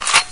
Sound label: glreload; svencoop; hl2; oicw